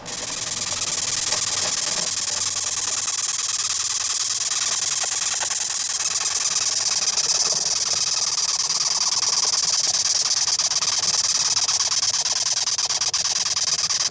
Recording Of A Velvet Red Ant, the recording is of the insect crawling; soundscapes